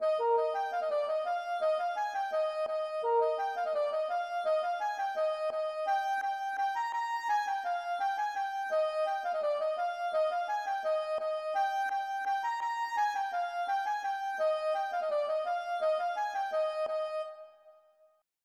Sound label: gavotte; Cap Sizun